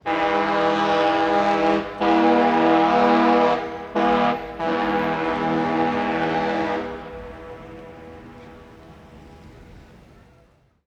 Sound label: O Canada Horn